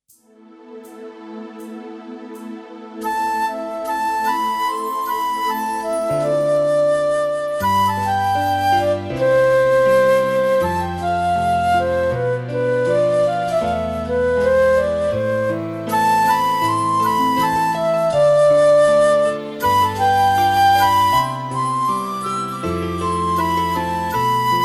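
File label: demo for flute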